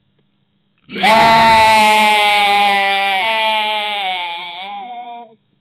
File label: a short hard cow cry